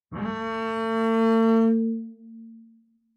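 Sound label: Bass arco fortissimo sul G Bb2, Double Bass; MIS Pitches - 2014; Strings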